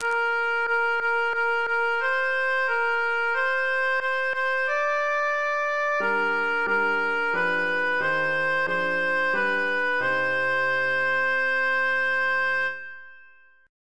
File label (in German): sopran